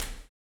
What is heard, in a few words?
STOMPS; Spectrasonics; Vocal Planet; Blues Soul